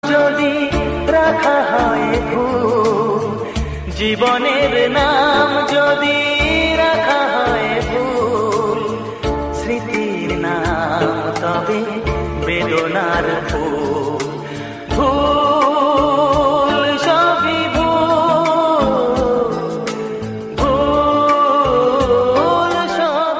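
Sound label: Bangla romantic ringtone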